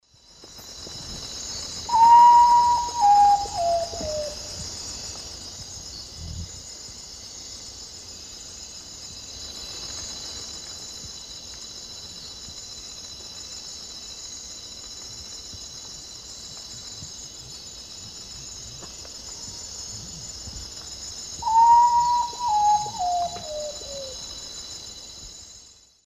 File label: Nyctibius griseus, song; S. America; Eastern Lowlands